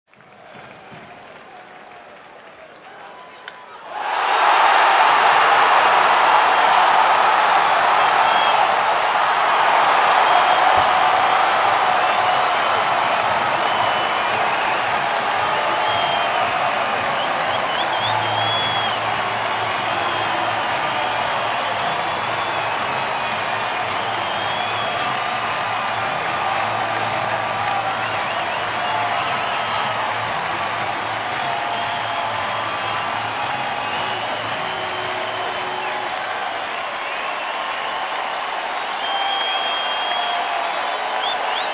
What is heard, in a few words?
Batter hits home run